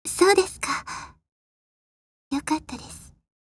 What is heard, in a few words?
ice